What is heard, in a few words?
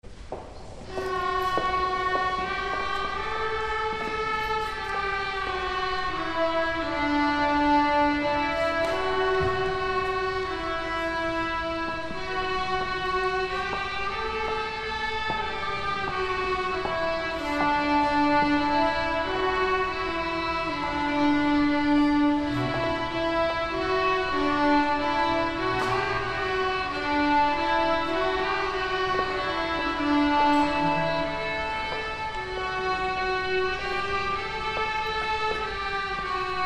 Communion violins; Cathedral Arts Project